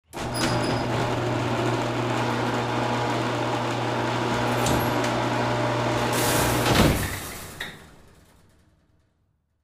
garage door close

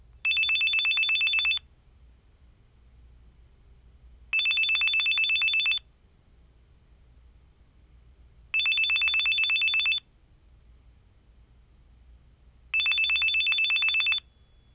PHONE

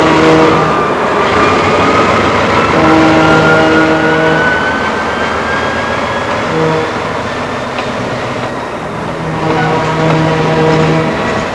DEEP TRN, aerei; trasporti

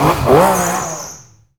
CAR START; trasporti; auto

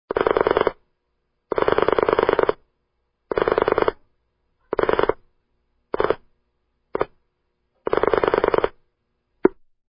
Telephone Dialing Pulse

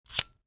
cigarette lighter

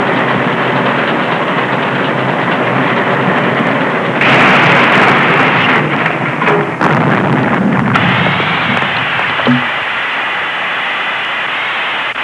CAR; trasporti; auto

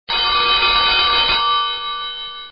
Phone Ringing

